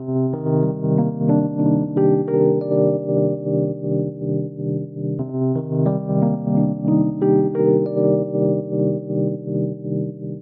Ostinato Two Hand Arp C Minor 11 92 bpm, Electric Keyboards; Ableton; Factory Packs